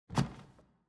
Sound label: Footstep Wood Stairs